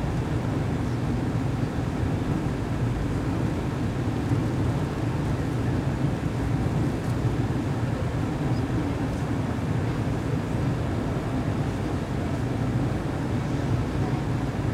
border ambient; Papers Please

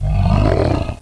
moan; ogrim

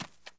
run; dirt foot

